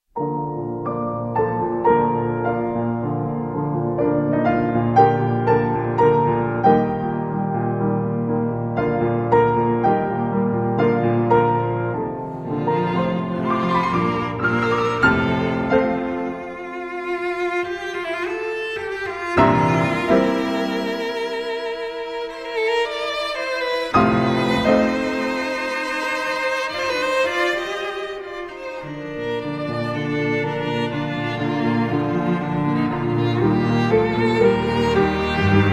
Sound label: Piano Trio in B flat major; Beethoven, Ludwig Van; Opus 97 -Archduke